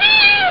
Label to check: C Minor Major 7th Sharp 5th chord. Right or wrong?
Wrong